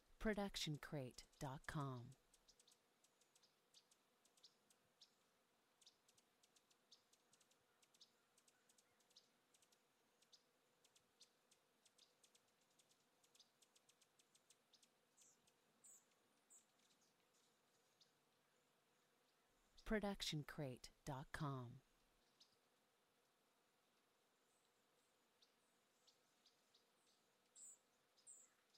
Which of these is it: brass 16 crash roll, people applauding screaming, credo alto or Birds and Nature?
Birds and Nature